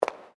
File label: FOOTSTEPS WOMENS HIGH HEELS CONCRETE STEP; Footstep Foley; Footsteps (Sound Effects:Human)